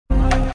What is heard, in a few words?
emote dignified music intro; actmod; fortnite